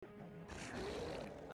Ruf Pilzkreatur

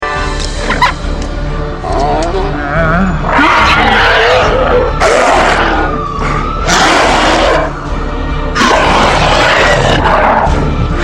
Ruf Arborealer Raptor

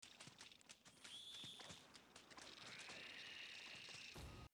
Ruf Skorpion

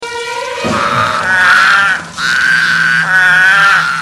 Anurognathus Ruf

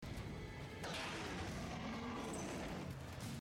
Ruf Giganotosaurus

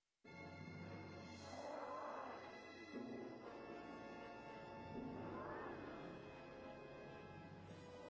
Ruf Iguanodon

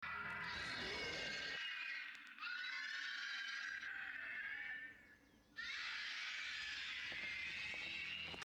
Ruf Titanis

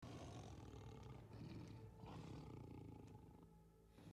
Ruf Smilodon

